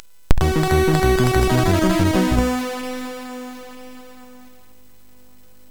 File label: Blob, MOS6581R4; hvsc